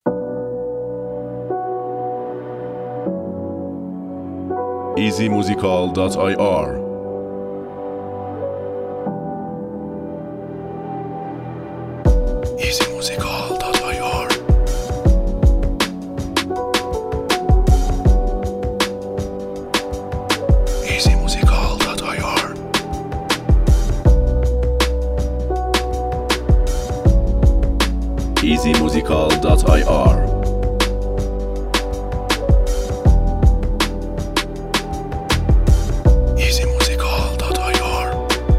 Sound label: Beat